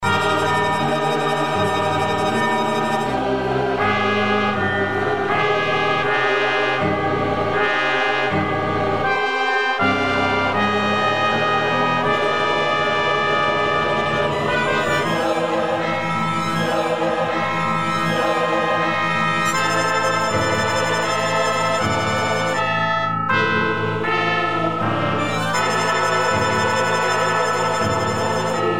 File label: FIRE Synth